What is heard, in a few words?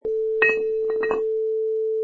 Weight Drop